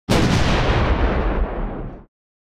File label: gun cannon; NCH Library